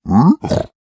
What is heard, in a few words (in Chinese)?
猪灵待机声